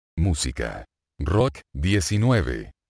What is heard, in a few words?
rock